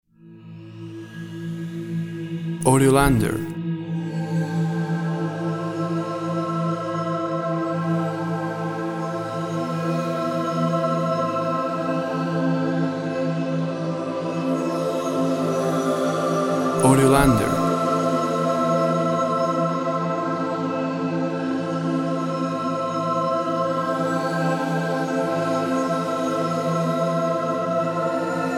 Ocean Vox

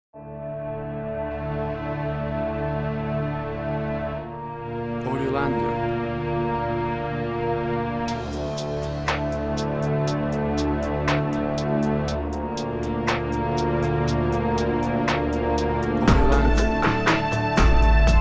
Crime Drama

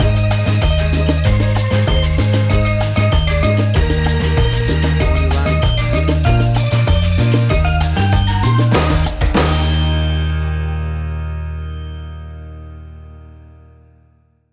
Calypso Island Sunshine Groove

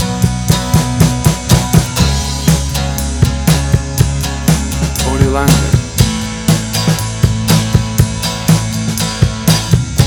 Bold and Big Indie

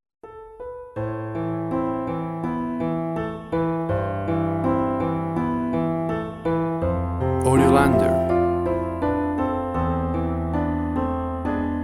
the beginning of the end piano

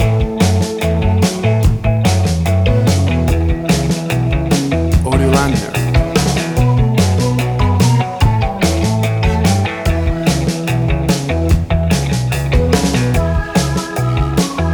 50s Pinup

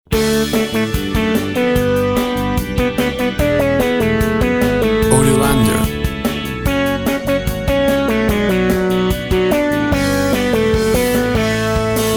Uptempo Kids Theme

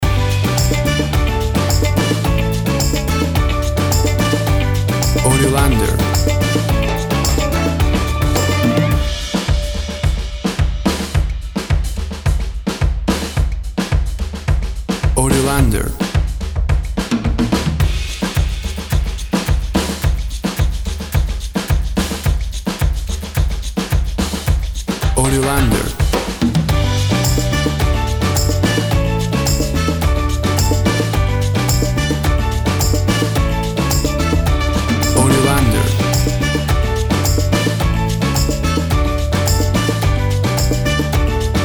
Upbeat Uptempo Calypso Summer Music

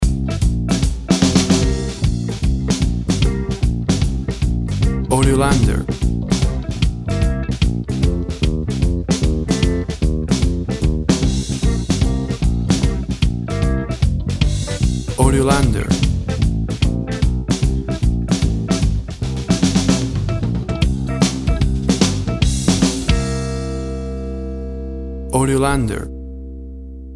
Walking Swinging Blues

